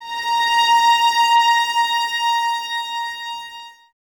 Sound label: VNS A#5, Violins, String Ensembles; Miroslav Vitous